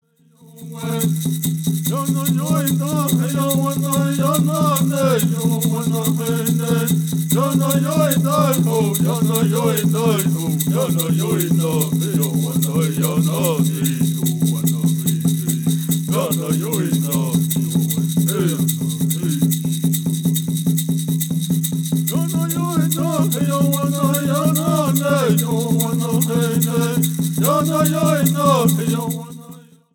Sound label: Peyote Song